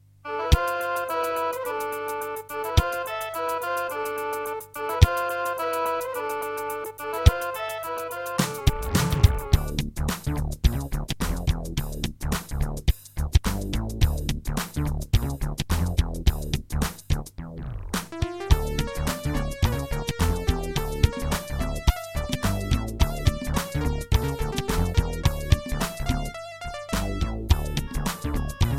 Aztech Sound Galaxy Wave Power; Lost Vikings

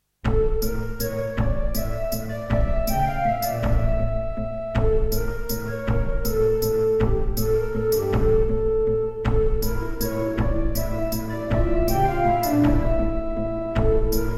Alesis NanoSynth

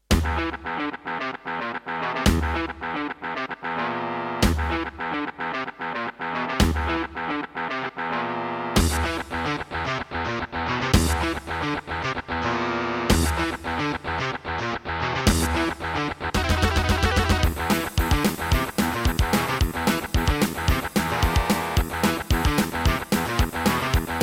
Roland SC-88 Pro 55MAP; Doom

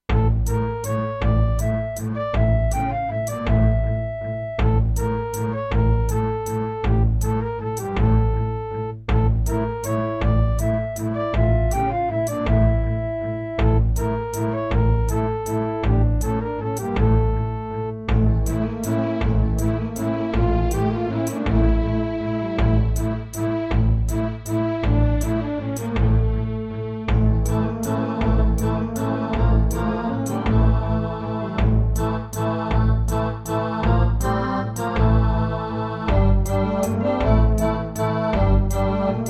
Ensoniq VIVO 90DB-A

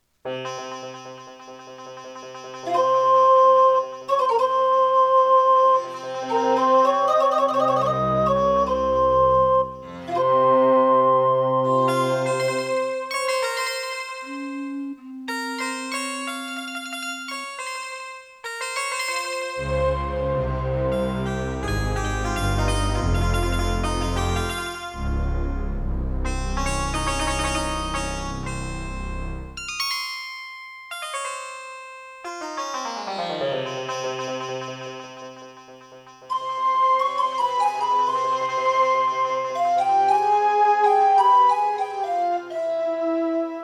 Intro, Roland CM-500 GSMODE; Prince of Persia 2 - Intro

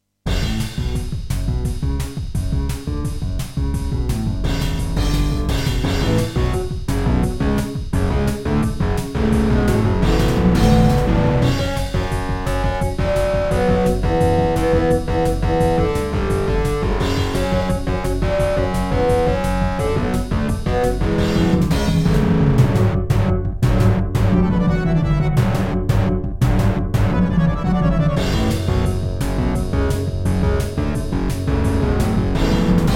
Intro, McFly; Serdaco; Duke Nukem 3D

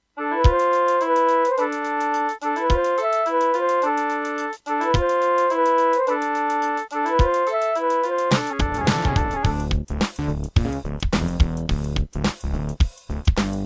Serdaco Qwave; Lost Vikings

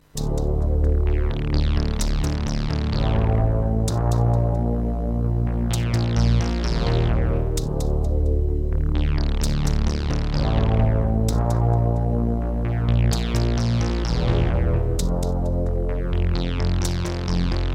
Creative MIDI Blaster MB10; Descent II - Briefing

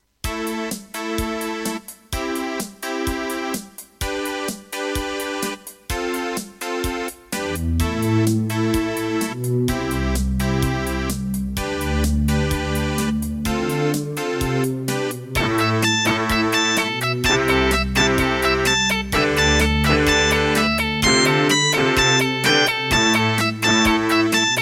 Wave 1, Creative Sound Blaster AWE32; Raptor

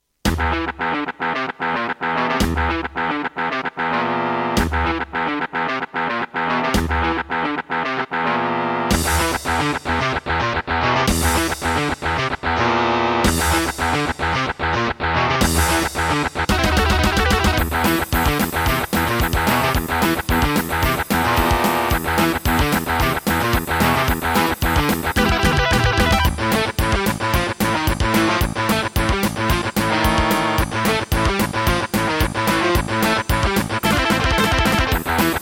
Roland SD-50 GSMODE; Doom

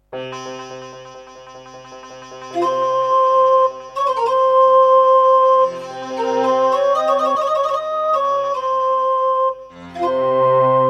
Roland SC-33; Prince of Persia 2 - Intro

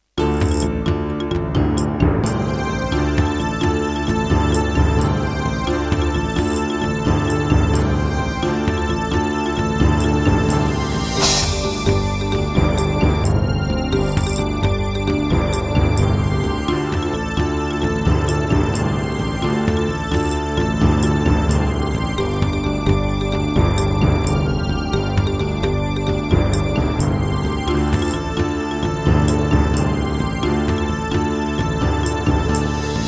Pine PT-202 Vivaldi Wavetable DB; Heretic

